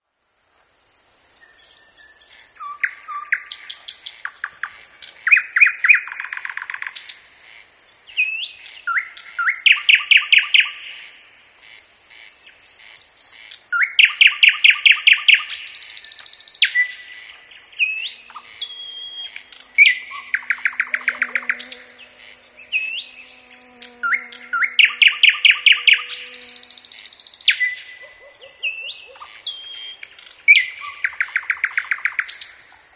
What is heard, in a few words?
thrush nightingale